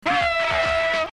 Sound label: Horn